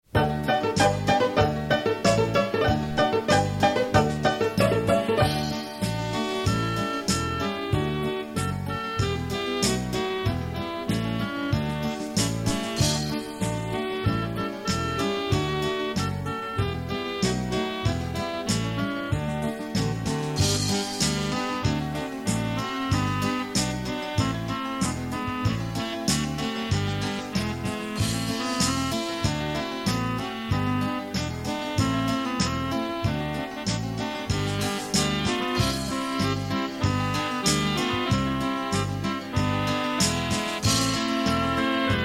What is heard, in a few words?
melodiya; muzykalnaya skazka